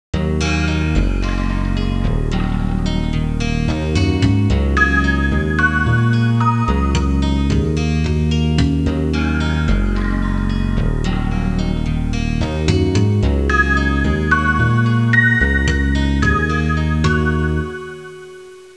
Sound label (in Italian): musica elettronica; electronic